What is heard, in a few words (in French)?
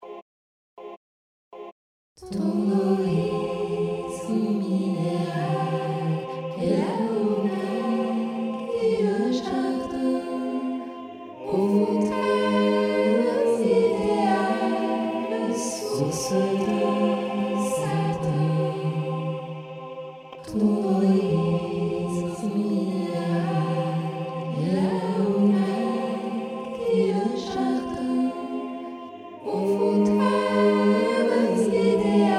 chorale tutti